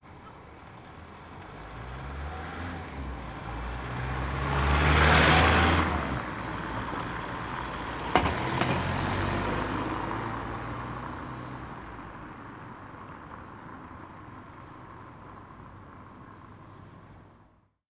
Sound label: Puzzle Passing Cars